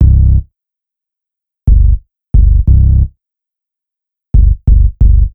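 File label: Blinds, bass, hip hop; бас сэмпл хип хоп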